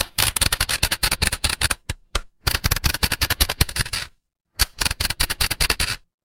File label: mechanical gear